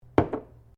JAR GLASS PLACE CUPBOARD; Various kitchen jars; Set Downs; Objects

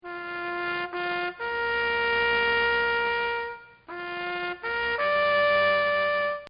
Bugle Plays Taps; Horns; Musical; Partners In Rhyme

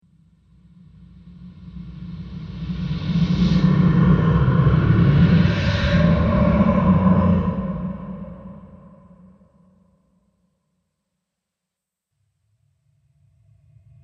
Deep Space Demon Collection, Alien Sound Effects

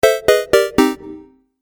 Game App Fail; video game

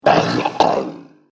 Alien Ogre; Alien Sound Effects

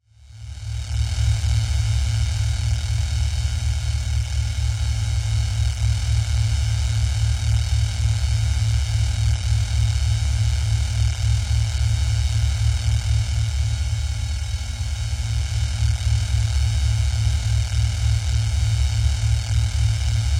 Science Fiction Electrical Hum Drone; Drones; Sound Design

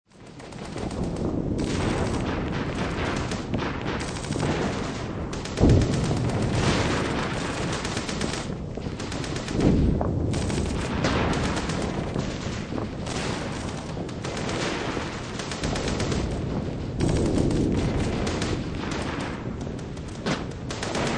Warfare World War Two Battlefield Active; WWII; Battlefield Sound Effects

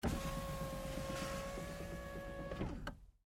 GMC Savana, Power Window, Down, Truck; 2010 GMC Savana; Trucks; Vehicles

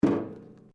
RUBBER ON METAL HOLLOW

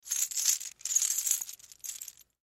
Necklace Pearl Put On; Rustling; Objects

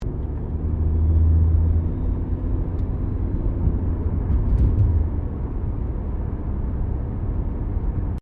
CAR SUBARU LIBERTY INTERIOR ACCELERATING; Subaru Liberty 20 01; Family members Subaru; Vehicles

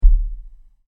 Bang On The Door, Door Knocking; Door Knocking (Sound Effects:Doors)

